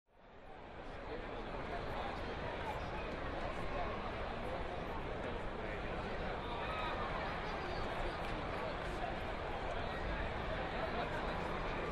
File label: Large Crowd Idle Steady